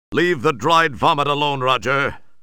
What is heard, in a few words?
Vomit